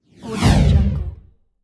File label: whoosh; transitions and movement